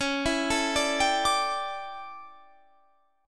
D Major 7th Suspended 2nd Suspended 4th C sharp, chord